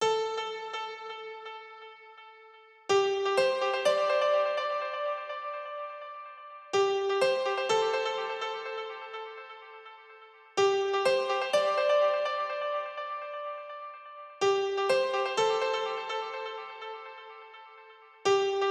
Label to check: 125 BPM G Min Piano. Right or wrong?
Right